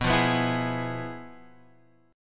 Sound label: F Minor Major 7th Flat 5th, B